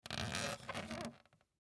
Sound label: Serving Tray Leg, Serving Tray Leg Fold Movement Creak; Foley; Miscellaneous Bar; Blastwave